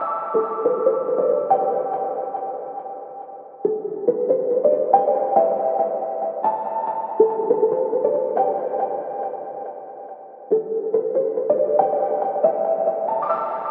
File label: Bpm140 Synth Loop Am, Dubstep; Airy; Loss; Prime Loops; Dubstep Illusions